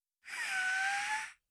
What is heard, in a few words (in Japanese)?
happy wa, breath